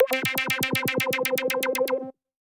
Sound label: C4, SynthLine; 90 s Sample CDs; NiceBeats; SynthLine VOL-14